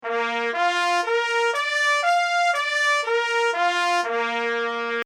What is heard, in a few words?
bugle scale